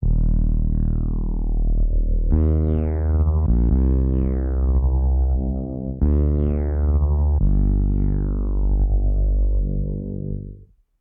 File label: 130 BPM G Min Reese, Melody Loops; Synth Collection; Cymatics; ANALOG EVOLUTION Beta